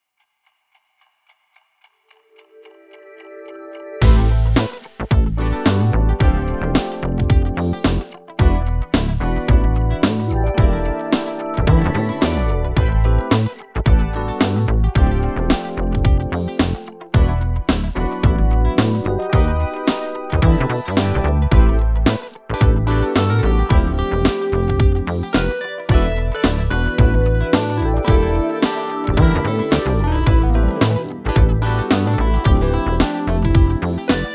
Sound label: Funk